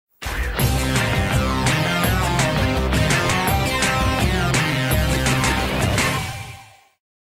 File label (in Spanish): Tema de Victoria; Wario; SSBU